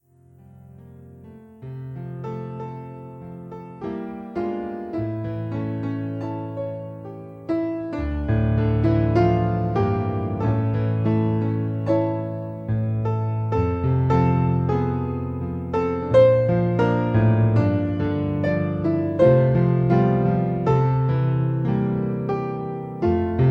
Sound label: O Little Town of Bethlehem; key impressions christmas vol 1